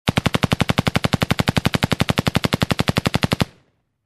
Stationary machine gun fire

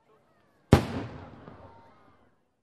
Rocket Firework Blast

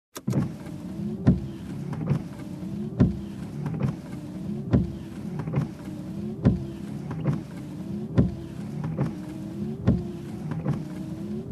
Car Windshield Wiper

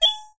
Electronic Button Sound

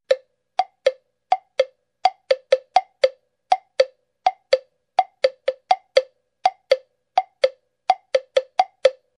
Woodblock rhythm

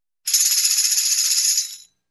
Tambourine Shake

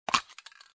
Opening graffiti spray can

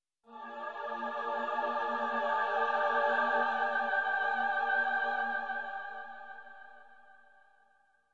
Choir dream sound effect

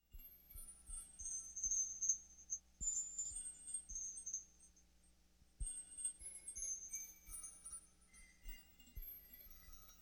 Atmospheric tinkle sound

